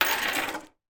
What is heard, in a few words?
game monopoly game metal playing pieces scoop or pick up from table